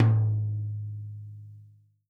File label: tom, Real Drum Kit, funk kit